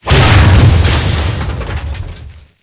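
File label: tank hit high-explosive anti-tank mid; heat; sfx tanks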